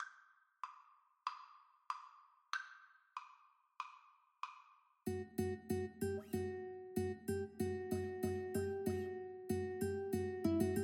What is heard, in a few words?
Guitar Duet; vivaldi autumn